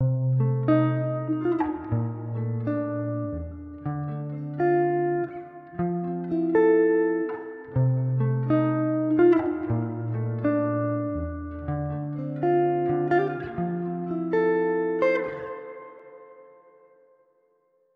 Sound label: Hall reverb example, guitar